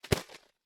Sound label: Bird Wings Fly Away; SoundsCrate